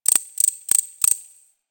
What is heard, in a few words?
Forest Close Insect Chirp; Warframe; Grineer Forest